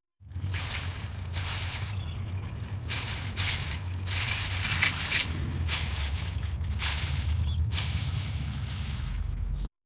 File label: soil dragging sound from, agriculture